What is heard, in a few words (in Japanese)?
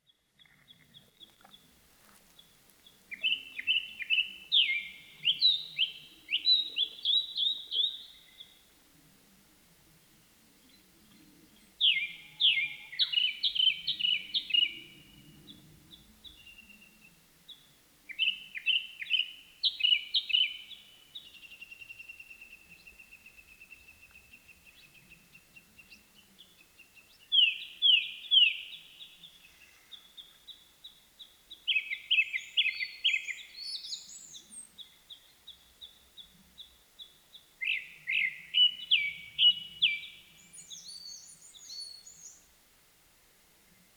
kurotugumi